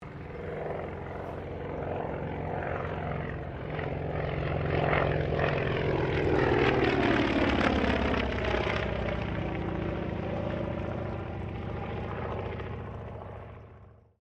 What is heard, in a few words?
Ceres Winjeel Wirraway pass, stereo; Mixed aircraft squadrons; Airplanes; Vehicles